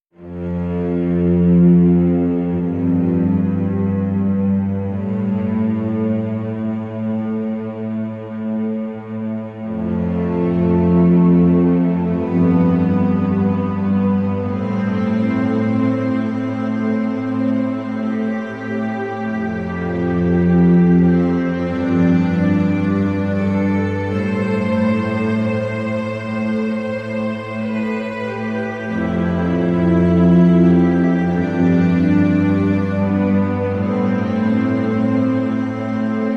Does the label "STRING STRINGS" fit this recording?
Yes